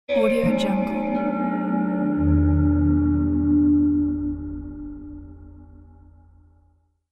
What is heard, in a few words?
horror transition